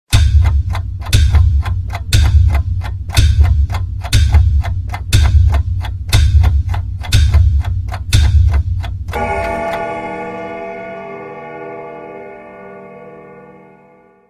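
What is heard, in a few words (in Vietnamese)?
Âm thanh đồng hồ đếm ngược 10 giây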